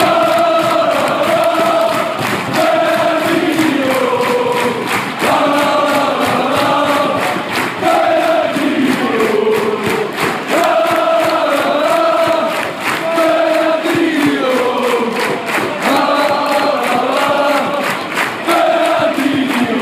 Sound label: woah woah, fanchants; fernandinho